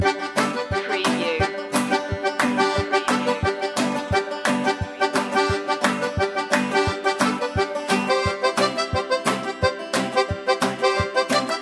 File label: scraping the roux no vocal shouts